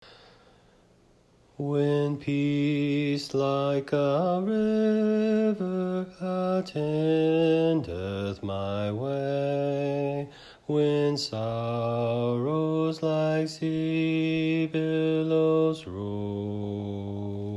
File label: It Is Well With My Soul BASS